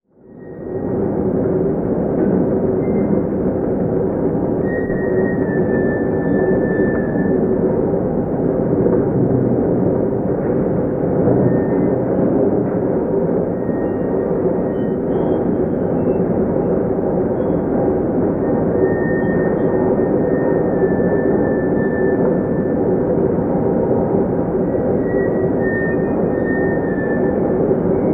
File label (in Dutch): kolen wasmachine